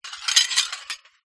household plate broken pieces movement

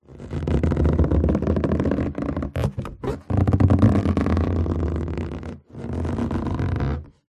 balloon inflated handling squeak creak; zapsplat

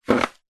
vehicles bicycle helmet pick up from ground; zapsplat

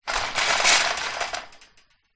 shopping cart metal push on concrete ground short; foley; zapsplat

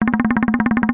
cartoon run or shake fast rhythmic wooden; zapsplat

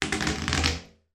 toy suction pad remove pull from bathtub

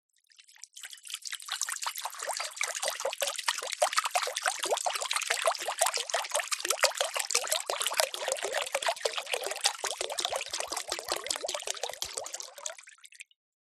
household water pour from bottle into glass bowl

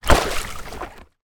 sport body board down in water; zapsplat

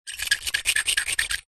glass shards x2 scrape together fast rubs; foley

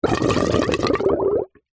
water gurgle bubble could be blocked drain; nature

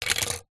household spectacles glasses down on table

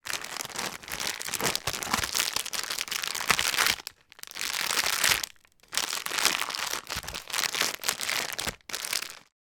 food tortilla wrap pack open handling; sound effects one

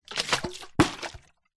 bottle flip plastic water bottle throw flip land

